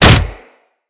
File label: Kick Hit, Melee Impacts; Weapons